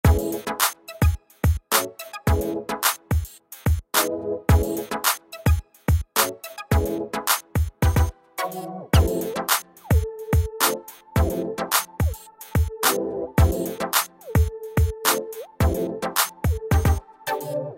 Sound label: samsung galaxy